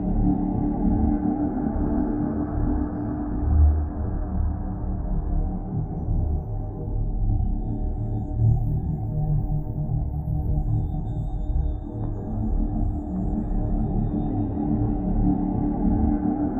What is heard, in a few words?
ship starbase idle; Stellaris; machineage